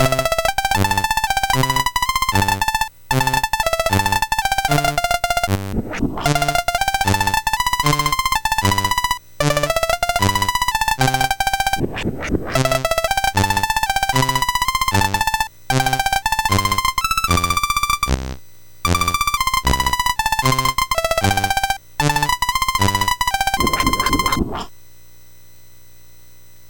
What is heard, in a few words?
Ducks Ahoy, MOS6581R4